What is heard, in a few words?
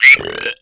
Hex Frog Death